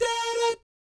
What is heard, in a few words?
"DAH DAT", vocal jazz fx